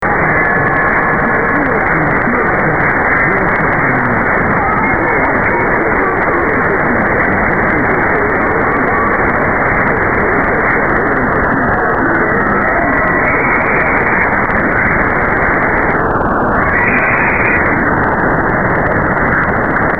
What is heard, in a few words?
very weak; 1510; kckk; perseus